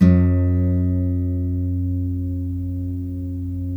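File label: GUILD 12-string, Guitars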